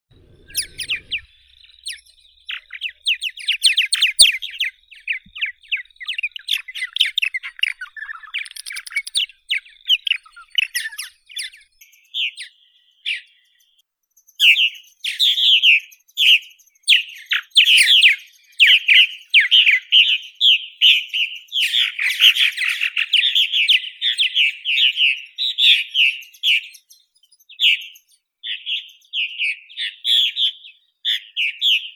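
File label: purple martin, Progne subis; lastochka purpurnaya lesnaya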